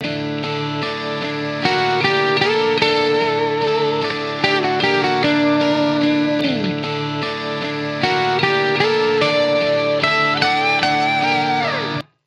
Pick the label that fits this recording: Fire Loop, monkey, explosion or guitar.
guitar